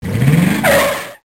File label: Car brake